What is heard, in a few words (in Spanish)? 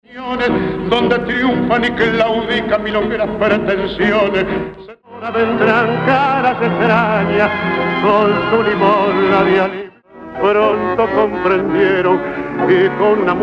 tango